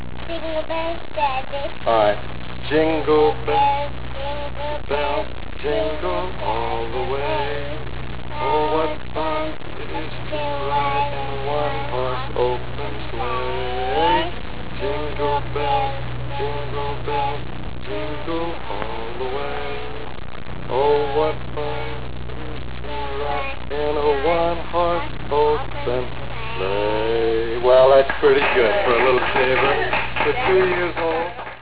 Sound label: jingle